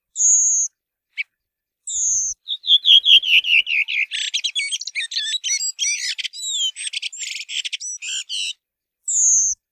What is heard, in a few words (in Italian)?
SASSELLO